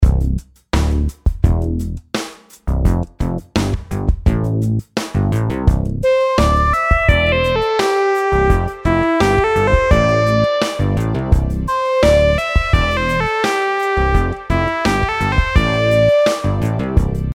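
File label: melodies simple 8s triplets C major; melody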